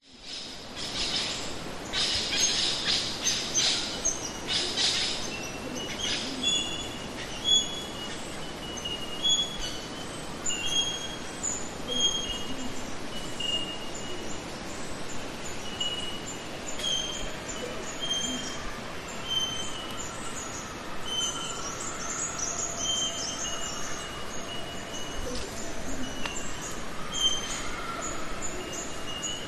nature forest ambience eastern australia summer bell minors distant kookaburra; zapsplat